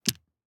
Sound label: DICE on Felt, Drop, Standard, 4 Four Dice; Samples; ArticulatedSounds; DICE Sfx Party (Extended)